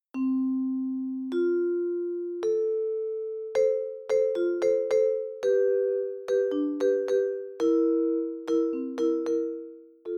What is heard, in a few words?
Vibra